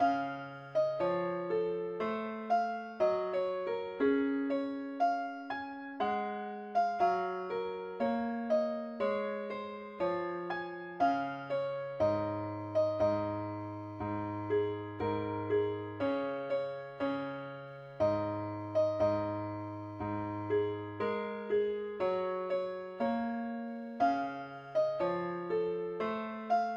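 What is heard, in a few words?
mandolin key c sharp; pilot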